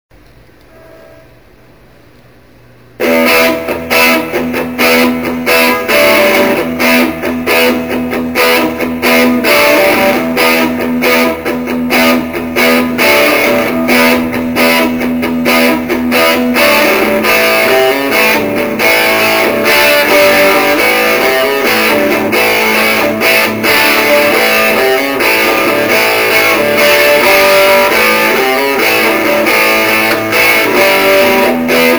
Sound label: Rythm